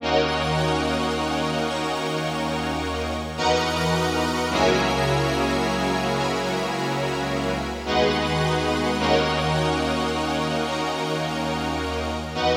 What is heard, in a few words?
E Minor 107 BPM